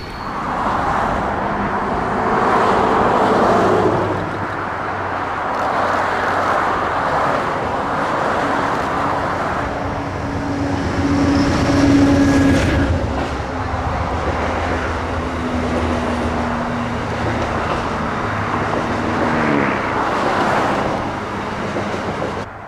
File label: overpass, train